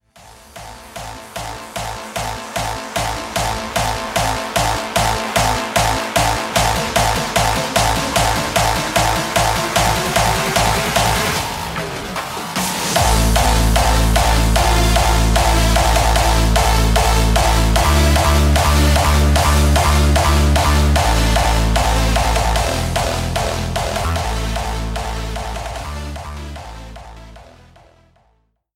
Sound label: Remix Ringtone